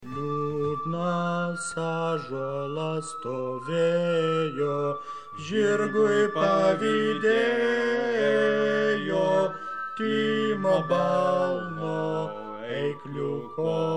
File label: HandBell sound track